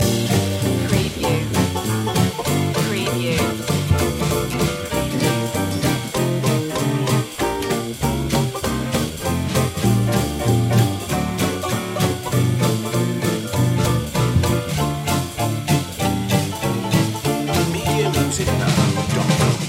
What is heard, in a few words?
charleston loop